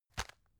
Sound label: tissue package put down